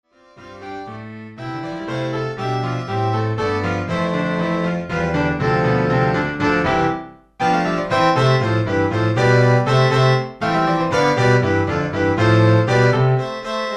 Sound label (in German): Quintett